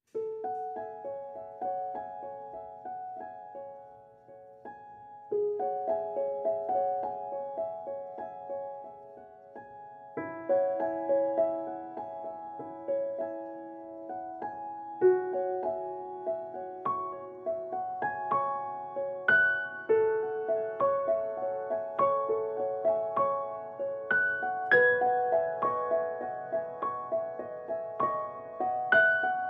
Piano

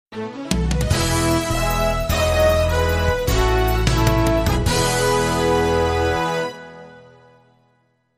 Big Show Intro & Sting

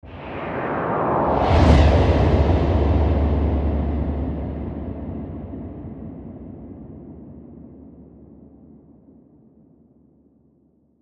Windy Sweep

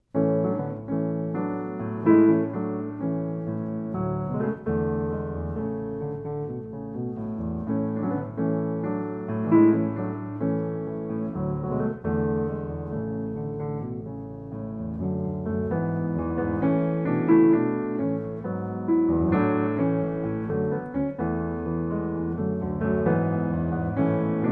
Solo Piano

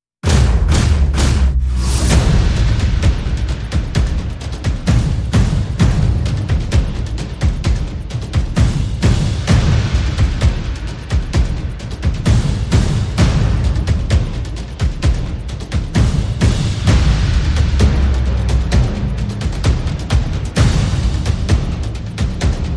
Perc and FX

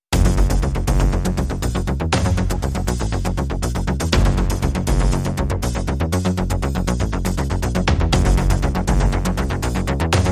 No Feedback Guitar

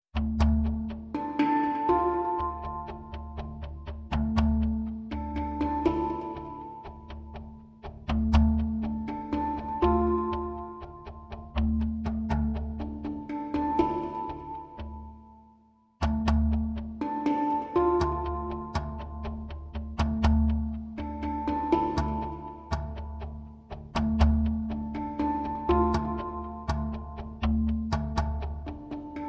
Lead Motif Only

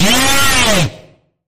Sharp Bend Ahead, C sharp

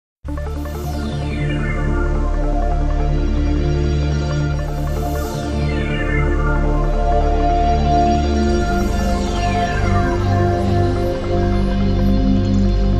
Pure Ambience

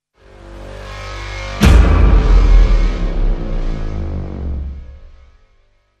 FX Hit, Sting

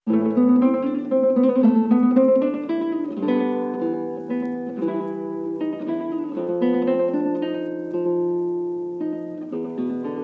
Spanish Flair, No SFX No Vocals Mix

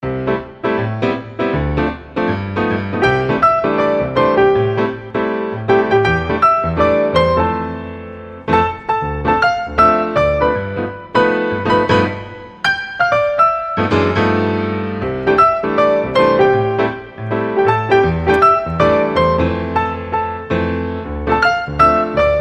Jingle Bells, without Jingle Bells

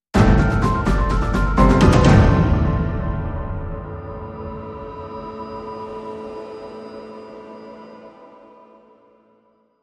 Bumper

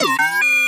Droid Computer Talk

